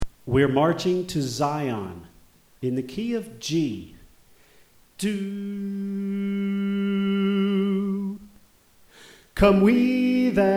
Soprano

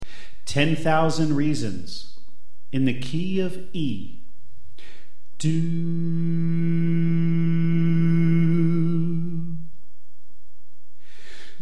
Soprano Left

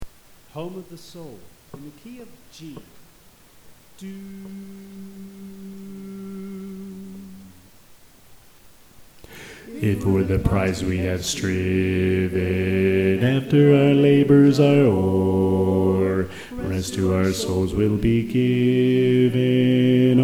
Bass

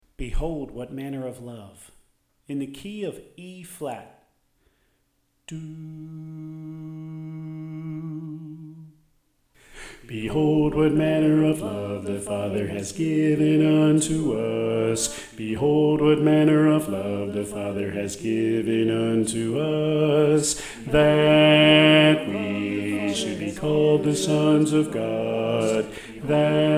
Bass

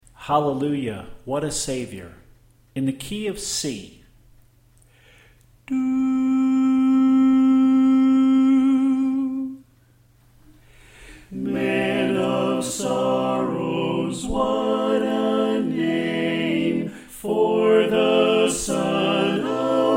Soprano Left

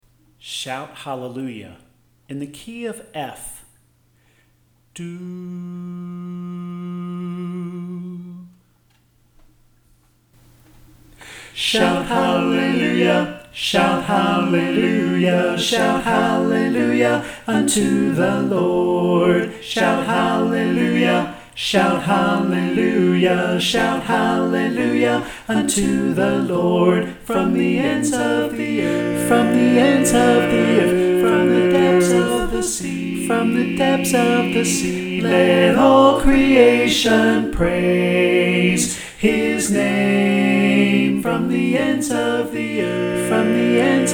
Equal Mix

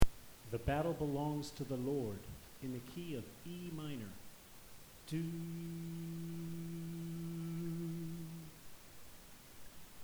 Bass

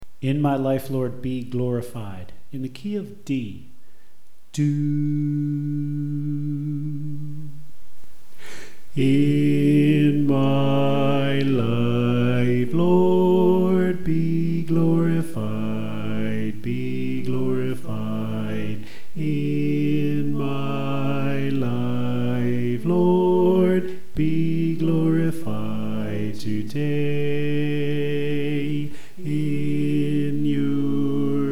Bass

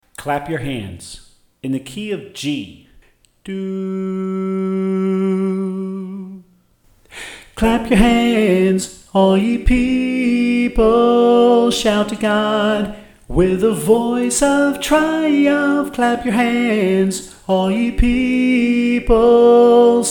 Soprano

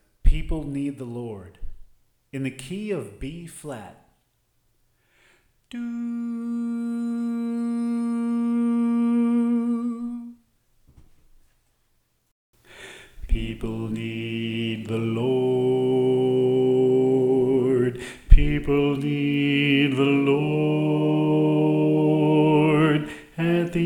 Bass

